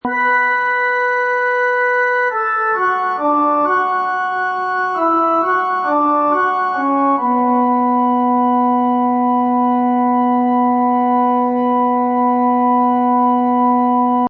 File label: Horr Pipe Org Flute Bm, Pipe organ horror loop in B minor at 135 bpm.; Keyboard Loops